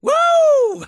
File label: woo